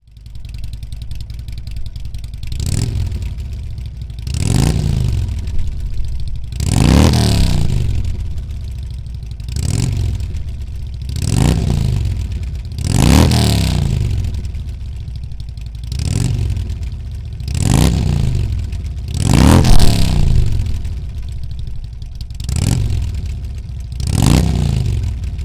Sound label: Touring 103 Slimline 4 Rev